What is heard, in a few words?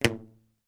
foley rubber band ping tight; zapsplat